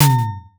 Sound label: tiny drum